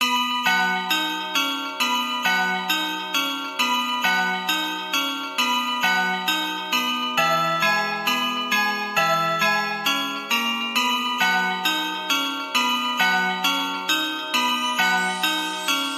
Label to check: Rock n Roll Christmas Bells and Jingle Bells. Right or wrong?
Right